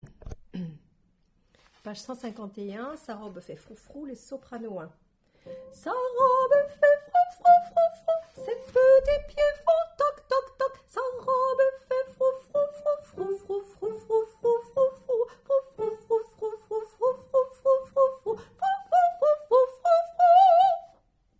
Soprano1

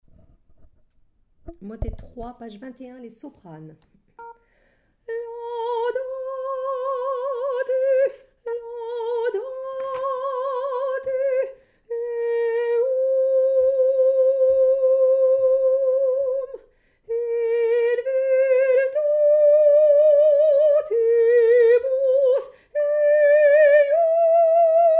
psaume Soprano

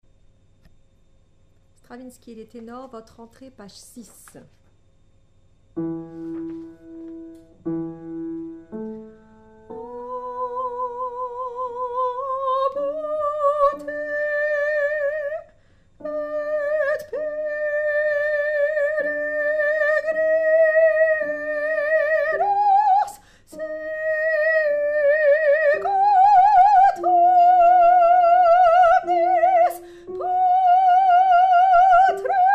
psaume Tenor

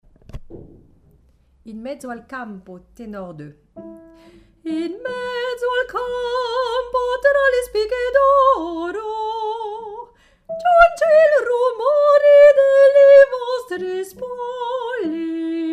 Tenor 2

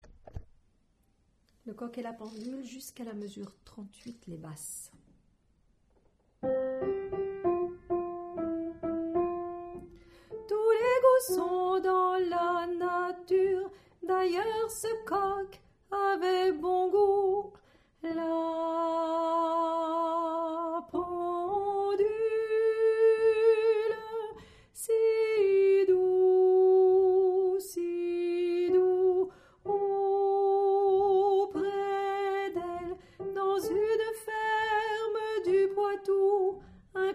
Hommes

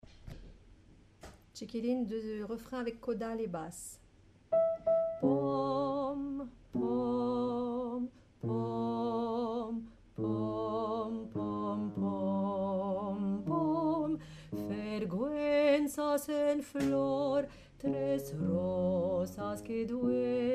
Basse

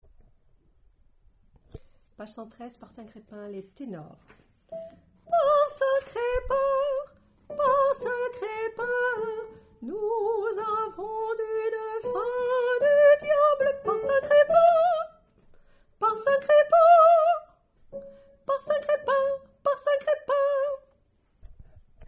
Tenor

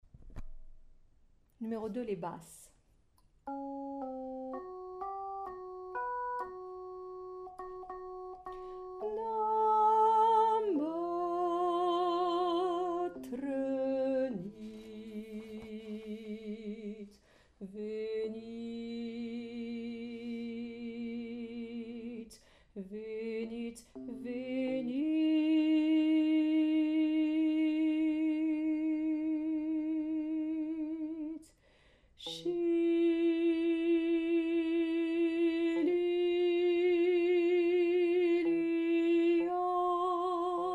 Basse; pop

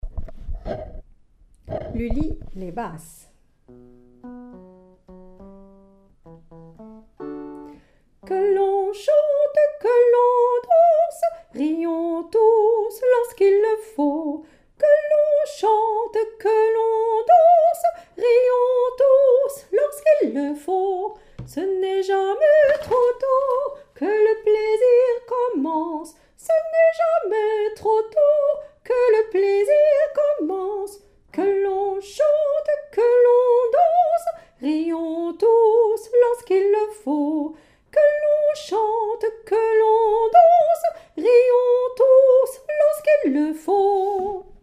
Basse; atys

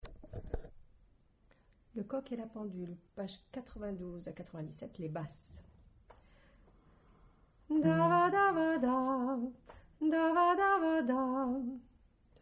Basse

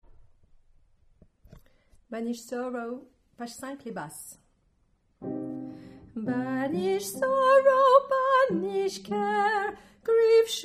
Basse

